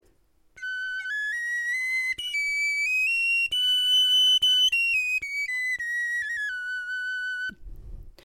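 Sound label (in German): tonleiter, Knochenflöten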